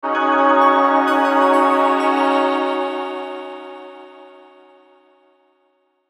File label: Logo Swell Vocal Pad Synth Melody Reverb; Sonic Logo; Blastwave